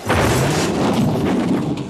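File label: bus crash; cstrike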